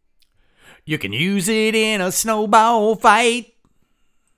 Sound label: vocals